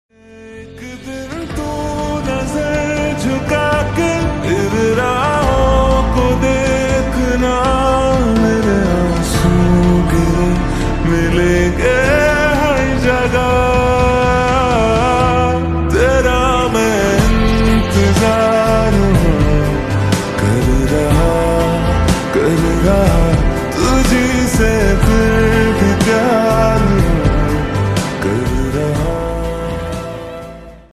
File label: Slowed Reverb Ringtone